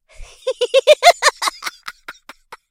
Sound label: laugh